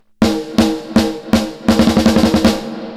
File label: snare default; Ultramarine